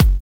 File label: KIK, Drums